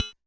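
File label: Kit Triangle, Realistic Concertmate-670; One Shot Kit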